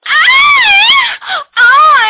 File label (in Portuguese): orgasmo